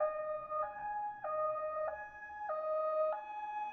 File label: Amb Generic; Dirt 4